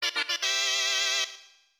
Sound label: You Win short, Win Theme (short); DM64